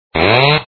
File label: chainsaw; Zeebarf; nursing